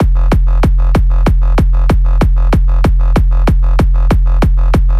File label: KICK BASS F LOOP